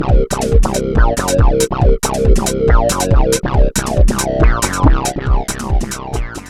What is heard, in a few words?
Kawai s5000